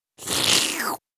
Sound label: Slurp